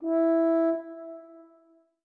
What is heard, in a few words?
orbit hornsolo; Sky Children of the Light gamerip